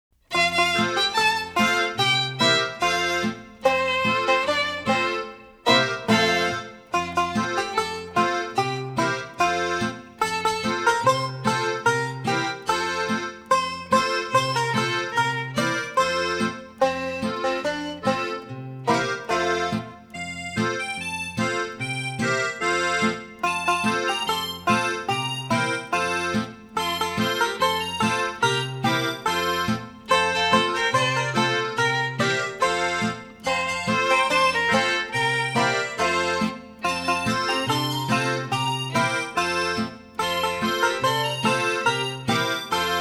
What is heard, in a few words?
youve had a birthday music only; childrens songbook